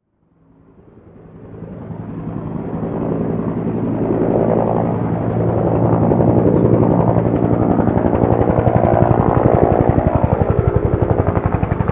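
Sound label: helicopter flyover; ModernWarfare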